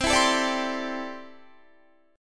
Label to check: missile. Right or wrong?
Wrong